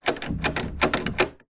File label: household door handle try open locked